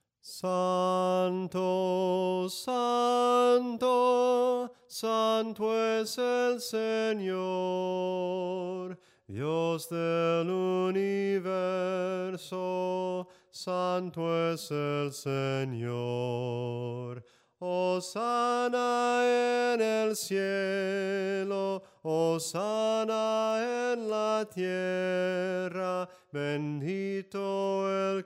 SOPRANO CANTADO; ¡HOSANNA EN EL CIELO

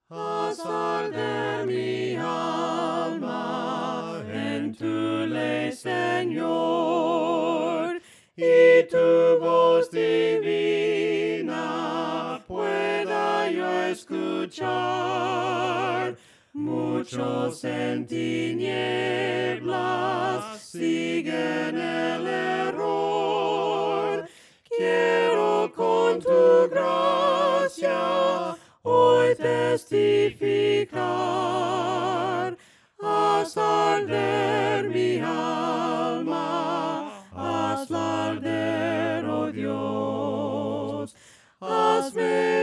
voces; HAZ ARDER MI ALMA; cantos del camino

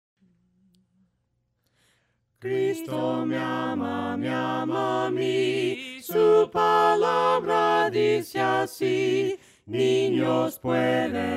CRISTO ME AMA VOCES, himnos a capella; cantos del camino

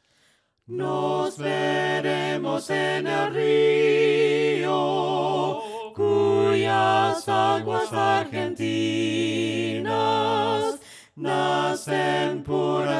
VOCES, himnos a capella; cantos del camino; NOS VEREMOS EN EL RIO

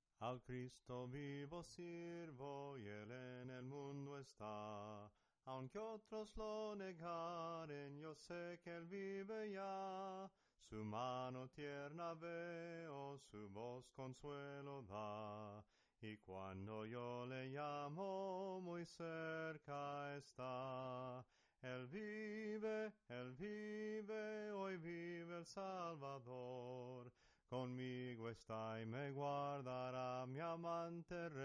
SOPRANO CANTADO, himnos en melodia soprano

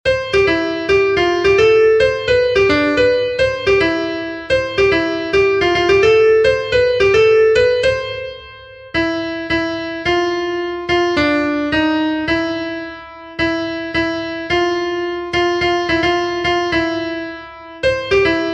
CONTRALTO, himnos en piano; GRACIAS DIOS; cantos del camino

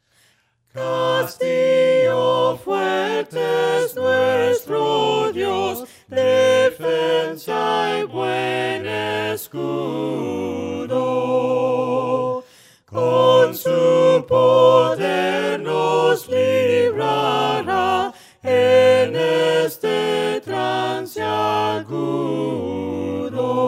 VOCES; CASTILLO FUERTE

